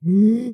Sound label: Grunt